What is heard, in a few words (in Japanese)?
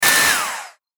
中型ロボットの駆動音